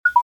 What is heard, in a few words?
Notif